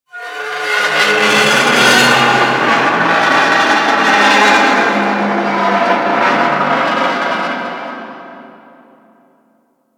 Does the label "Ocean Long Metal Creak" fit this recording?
Yes